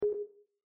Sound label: menu move; epervier